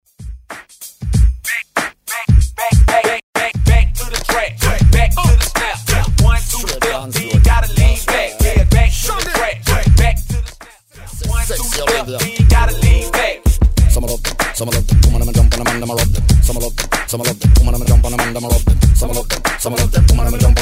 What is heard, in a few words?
Top40 Redrum 95bpm